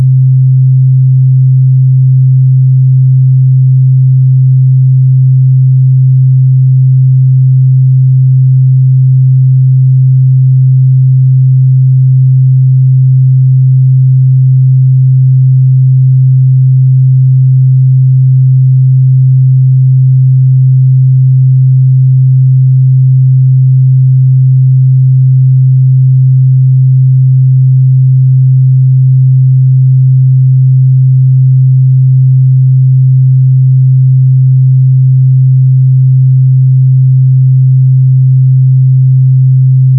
calming 528hz

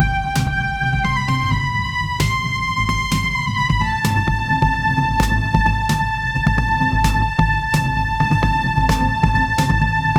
motivational theme

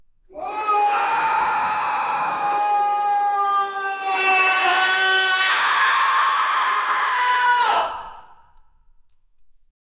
fiersome yell and shriek; deity

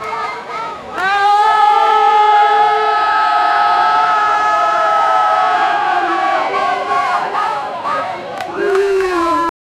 multiple excited human vo, concert

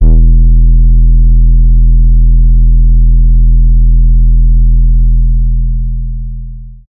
808 rich bass

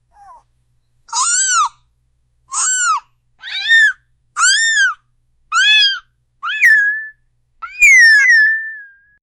baby shocking sound

